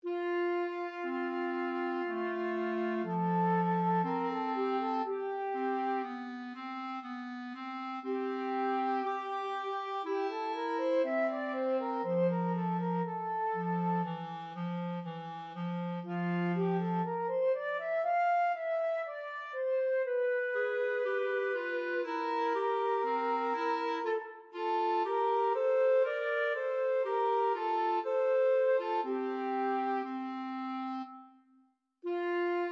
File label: flute clarinet duet